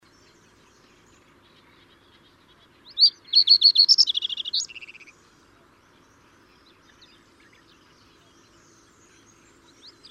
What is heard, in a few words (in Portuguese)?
escrevedeira dos caniços